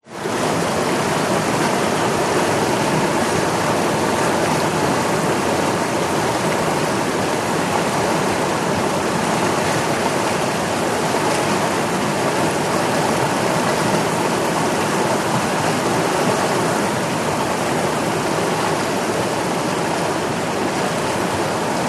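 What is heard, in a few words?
Large River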